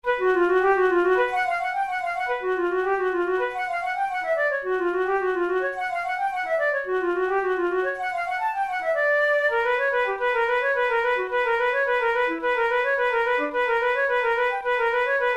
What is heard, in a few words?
methode pour la flute study; drouet